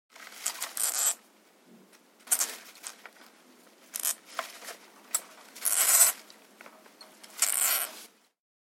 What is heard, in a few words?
Hamster explores new cage